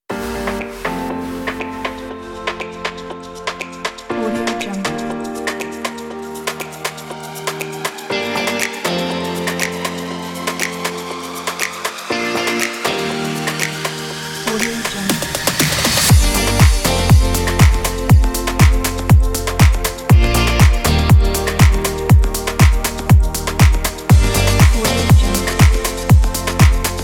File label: sexy